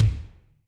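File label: GATE kick; Crypton; DrumBase5000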